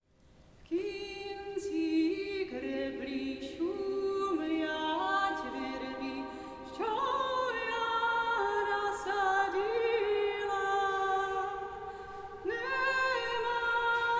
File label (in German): Ukrainisches und Kosovarisches Volkslied; Salonkonzert